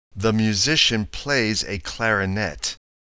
clarinet

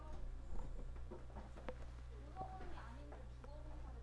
whip

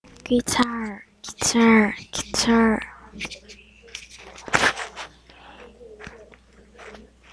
guitar

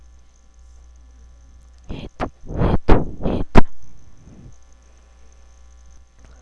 hit

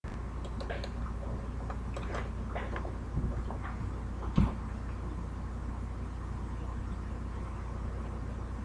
explode

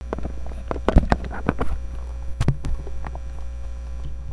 mosquito

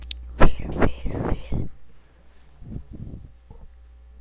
wheel

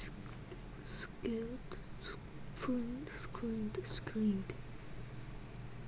scream

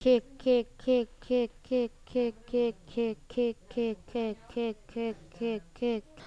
kick

